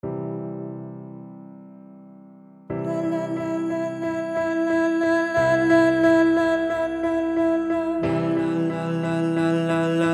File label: exercise male voice